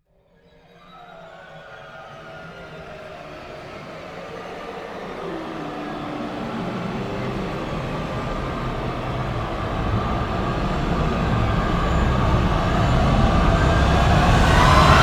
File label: orch and choir rise; immediate music